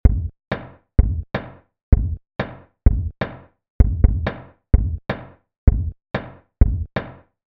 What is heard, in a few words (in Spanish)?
ejemplo audio Snare